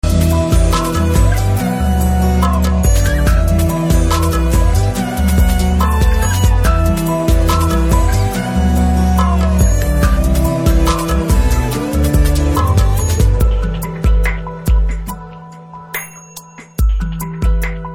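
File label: background music Ringtone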